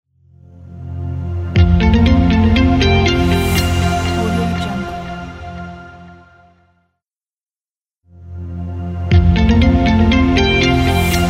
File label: cool bright easy simple logo